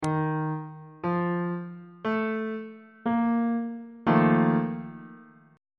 b flat major 7th suspended 4th 1st inversion on bass clef